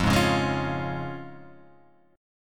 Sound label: F Minor Sharp 5th, F, Guitar, Half Step Down